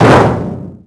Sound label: bouncy metal barrel hit; bouncy objects; Tony Hawk's Project 8; XBOX